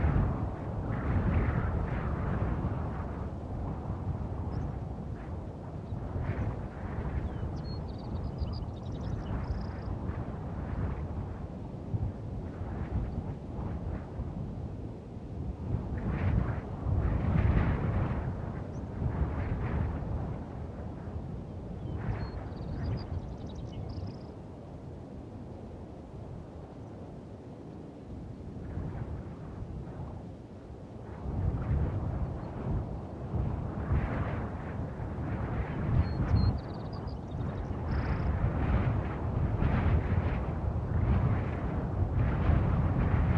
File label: Snow Forest; AudioWizards